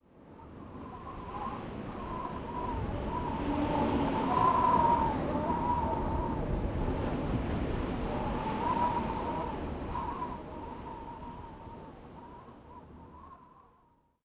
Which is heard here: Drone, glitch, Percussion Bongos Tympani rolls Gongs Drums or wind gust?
wind gust